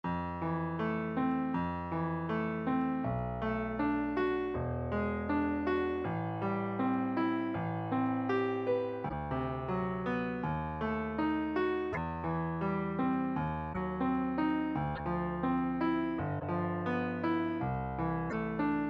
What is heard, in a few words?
tree arp